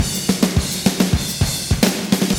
loop, Roland; Sound & Vision - Gigapack I

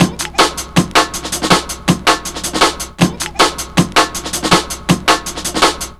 160, Drum Loops, Drum & Bass; Total Drum&Bass

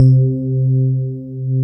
Pretty EP C2; Proteus 2000; Digital Sound Factory Proteus 2000

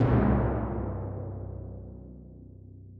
timpani C3; Perc; M8; BBC

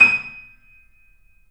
The Piano, D#; InVision Interactive - The Piano

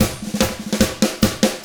Double Platinum Drums; 50S ROCK

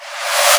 Boss DR-660 Perc, Drum Machines

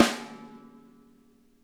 snare, drum kits

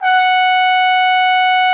TROF F#5, TRUMPET; Xsample VOL-3

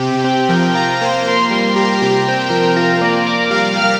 Lead, Synth Textures

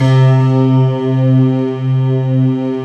STACKED, STACK PNO

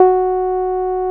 SIEL, String Machines; Best Service; XX Large Most Wanted 2; SIEL-CRUI

